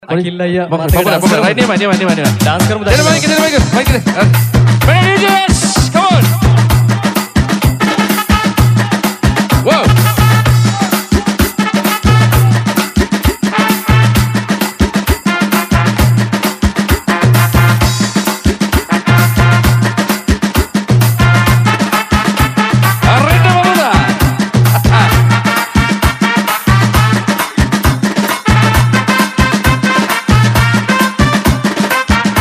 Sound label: Papare; Shaa FM 18th Anniversary Celebration